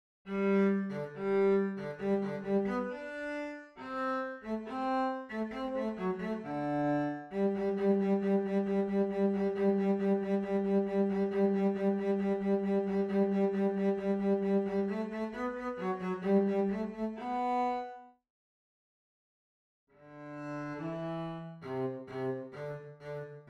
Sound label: Cello; Eine Klein Nachtmusik prepared for ctools by Shegolev